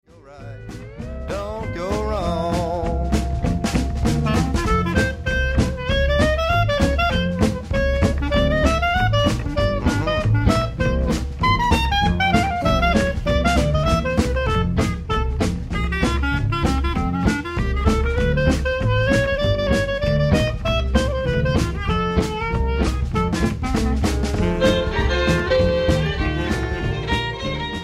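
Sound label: clarinet, jazz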